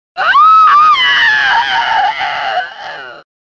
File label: scream; armageddon kitana